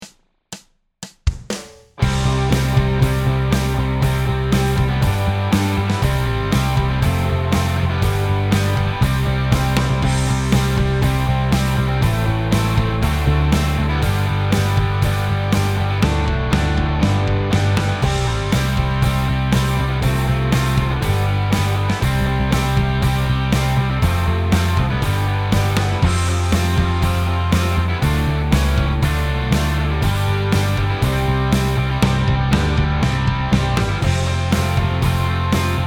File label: Minus Guitar, Performance Backing Track; Hark the Herald Angels Sing